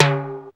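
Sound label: RX21L LO TIMBAL; Crypton - DrumBase5000; RX15+17+21L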